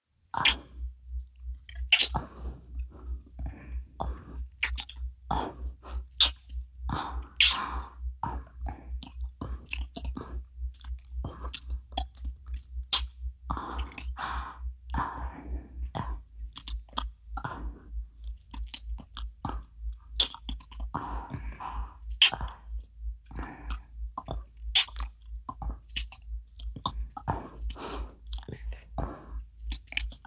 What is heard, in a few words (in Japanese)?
フリー乳首弄りループ音 ちゅぱ…はむ…, ASMR